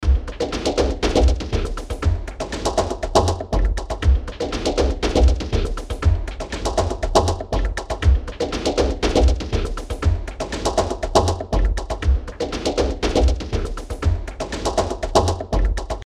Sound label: background